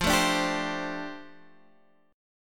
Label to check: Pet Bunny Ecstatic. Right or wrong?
Wrong